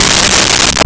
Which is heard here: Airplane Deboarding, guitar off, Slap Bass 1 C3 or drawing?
Slap Bass 1 C3